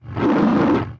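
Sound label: Boar Attack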